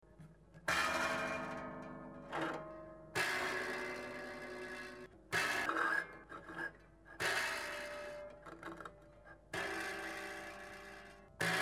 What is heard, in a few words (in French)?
tige prepa, touchers de la harpe